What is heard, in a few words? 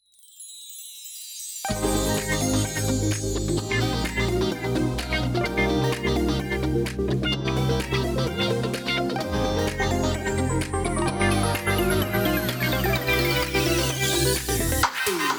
Anthem Inst